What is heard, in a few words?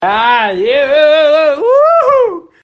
aaaeeeoo iuuuuju